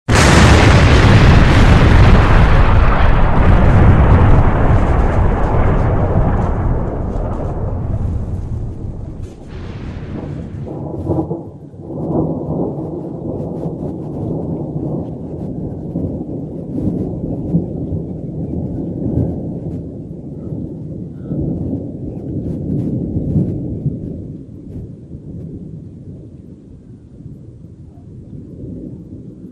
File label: explosion